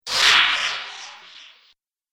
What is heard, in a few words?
Stardust Swish, whoosh sound effect